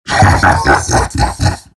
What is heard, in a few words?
terr morph laugh; Terrorblade